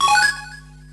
Se Sys Find

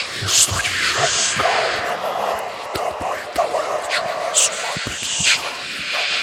Voodoo Trance; we are the dwarves gamerip 2016

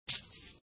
Chick Step; Extra Lives

